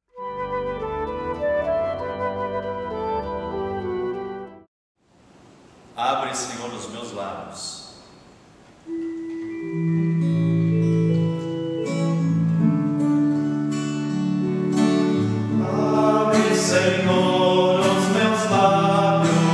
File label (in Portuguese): Abre Senhor os meus labios, cantos